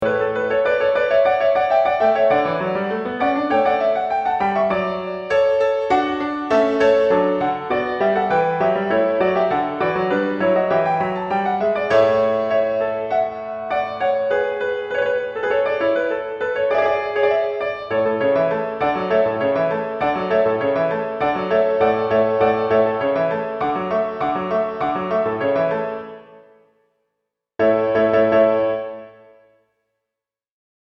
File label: Piano